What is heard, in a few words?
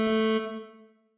ready; Techmino